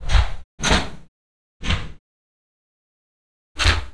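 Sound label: Door Close, Train; OpenBVE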